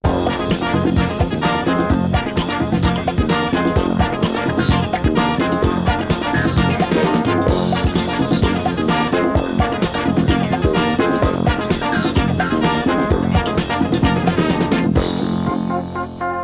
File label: drums records interlude